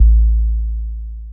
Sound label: Synth Bass